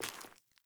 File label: Sandals Walk Rock, Footsteps; Characters; Player